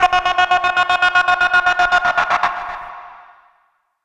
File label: Scratching, Vinyl Scratches